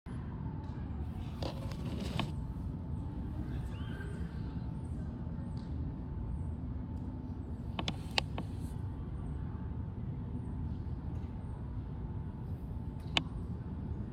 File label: Studying on the quad